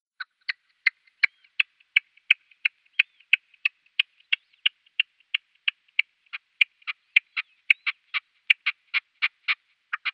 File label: Red-legged Partridge male display